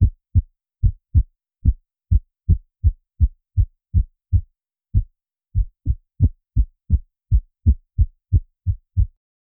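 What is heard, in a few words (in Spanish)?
latidos del corazón, heart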